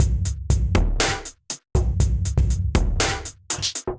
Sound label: Kit, Drums, Hybrid; SELECTOR Kit Magma; Ableton; Factory Packs; Drum Essentials